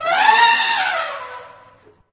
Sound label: trumpet; smite; voicepack; ganesha